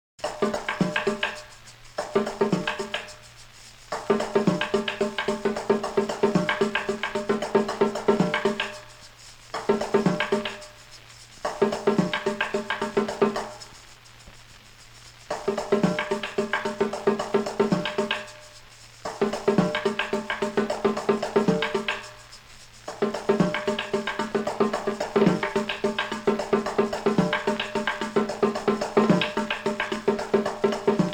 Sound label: Snaketime rythm